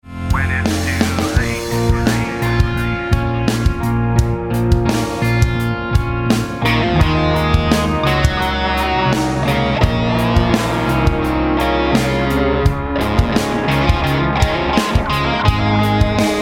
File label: guitar solo